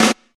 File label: natural steel snare drum a sharp key, snare drum sample tuned to the A# note